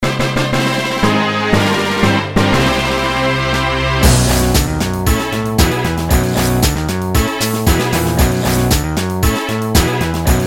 victory

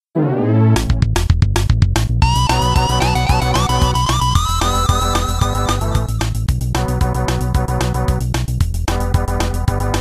stage clear

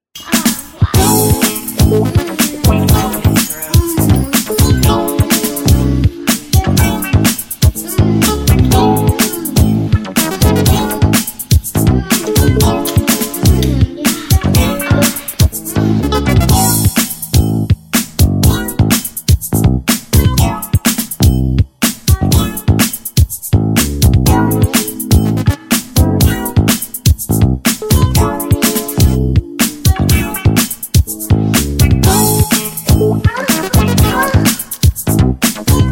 groove

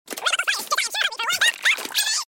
fryers

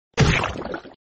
splat

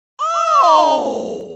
OOOHHH

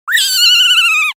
fall

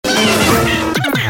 wide open

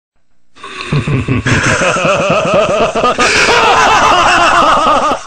laugh

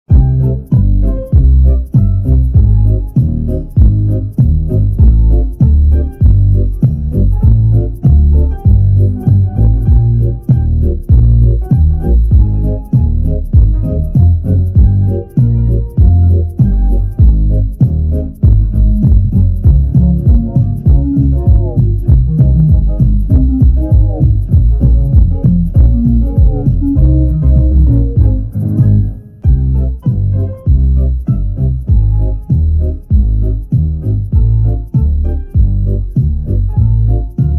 bass

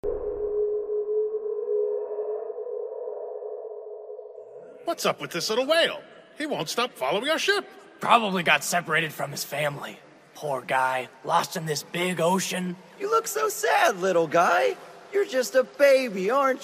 Casio

